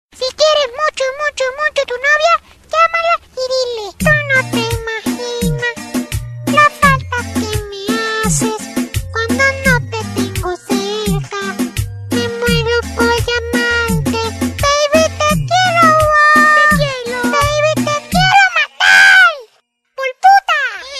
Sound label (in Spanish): baby cantando; latino